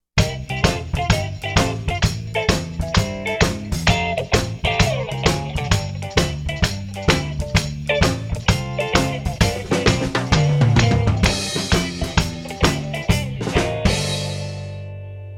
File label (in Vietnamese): nhac nen nhip dieu hoat hinh hai huoc